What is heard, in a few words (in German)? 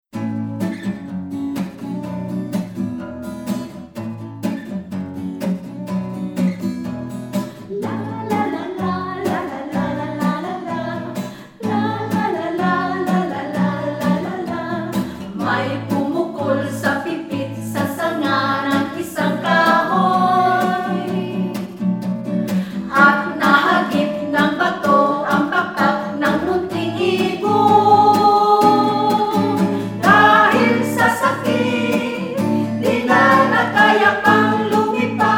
Phillipinen Ang Pipit Der kleine Vogel